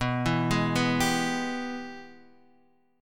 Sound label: G sharp Minor Major 7th Sharp 5th B